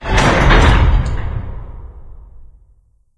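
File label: metal randoms impact, ambience; video games; source engine; portal2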